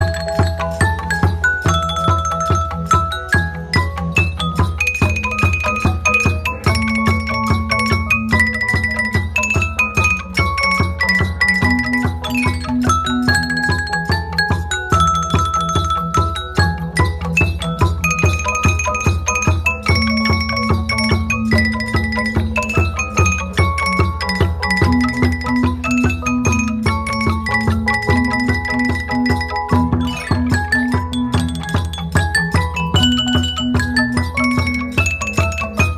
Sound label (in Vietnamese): Cong chieng Tay Nguyen; Happy New Year